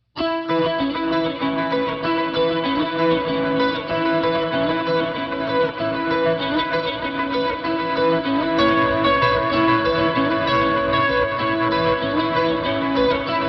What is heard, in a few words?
Dover Ambient